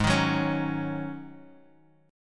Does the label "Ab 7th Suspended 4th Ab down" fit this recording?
Yes